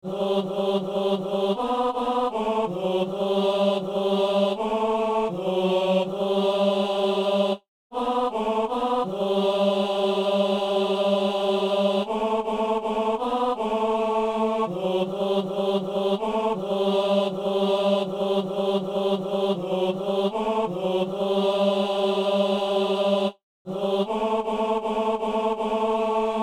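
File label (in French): ténor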